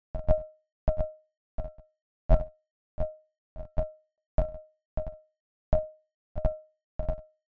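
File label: synth metal, walking sounds; The NIW Project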